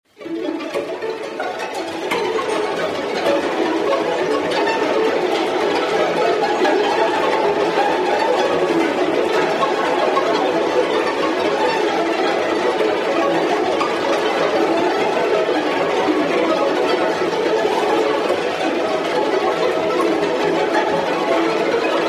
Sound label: ringtone pizzicatos